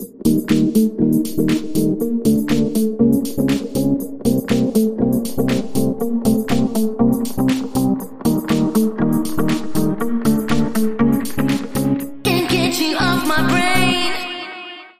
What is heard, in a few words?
Out Guitars And Claps